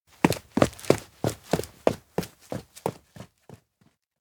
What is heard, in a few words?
steps outdoor trip run start; makoto; anemoi